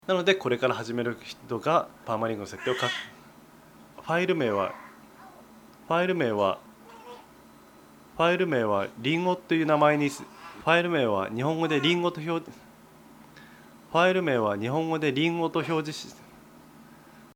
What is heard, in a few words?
baby voice